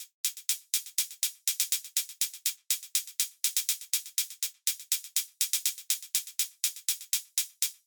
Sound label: Hats Hat Pulse, 122 BPM; mmb studio melodic